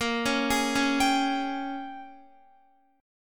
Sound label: A sharp Minor 13th A sharp down Guitar, chord; arpeggiated; JGuitar